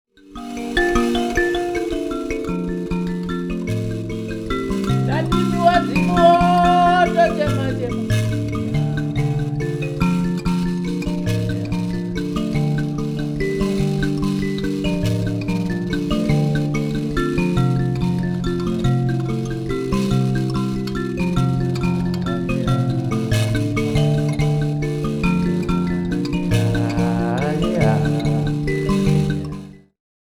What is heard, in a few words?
mbira